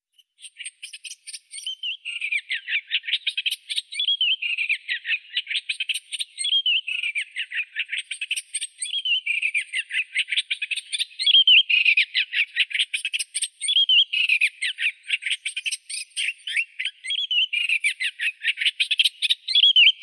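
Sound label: olivaceous warbler, Hippolais pallida; peresmeshka blednaya; zvuki prirodi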